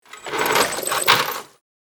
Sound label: Rummaging through toolbox; hand tool